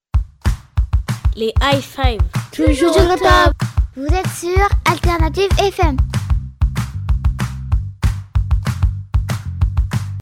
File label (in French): JINGLE; LES HIGH FIVE